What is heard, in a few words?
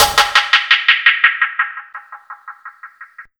TR DELAY; Ragga & Dub Reggae; USB Soundscan vol.27; AKAI